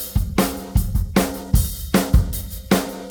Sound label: drum loop 4 bar 4-4, 154